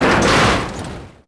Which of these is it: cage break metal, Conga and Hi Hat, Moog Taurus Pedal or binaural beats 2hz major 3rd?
cage break metal